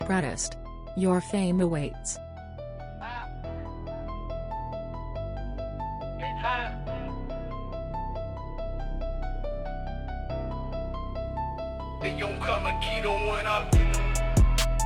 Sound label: gold tooth anthem, beats